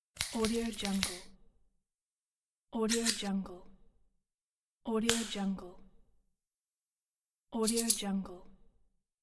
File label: snipping scissors